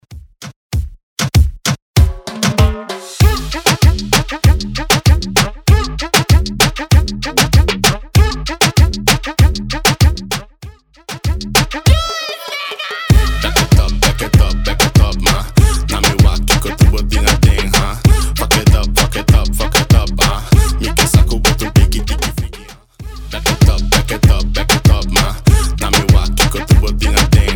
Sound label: Moombah Reggaeton 97bpm